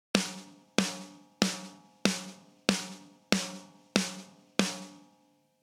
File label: snare on